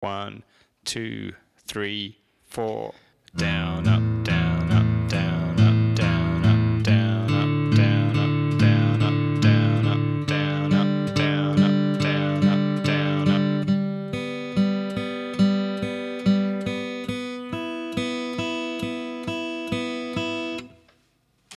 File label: picking outside, guitar